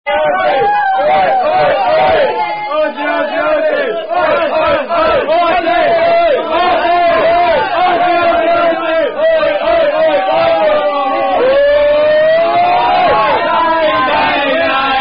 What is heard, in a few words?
Aussie Aussie Oy Oy Oy; Silvester